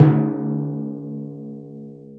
TYMP, ORCHSTR; WS#8 ORCHSTR

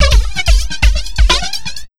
FX, Big Beat Drumloops; PHASE 130; USB Soundscan vol.25; AKAI

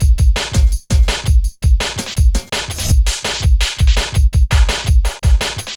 Hard Stepz, Drum Loops 166.5; Zero-G Jungle Warfare 1, 2 & 3

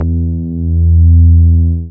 BAS MINI, Mini-Moog; Sound & Vision - Gigapack II CD 1

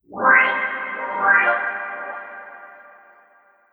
ORCHFX, orchestra, Asian Traditions

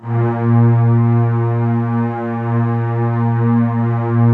A#2, Violin, LOW VLNS; Q Up Arts - Denny Jaeger Master Violin Library

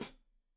Hat Foot, Hi-Hat, Cymbals Stereo

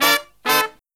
Killer Brass Riffs

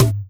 Percussions

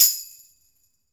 TAMB

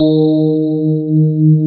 SPARKLES; Wizoo - Powered DX

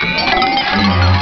d50 horror illus; ST-XX Amiga Soundtracker Sample Packs

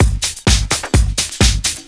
Straight Loop 128 BPM, House

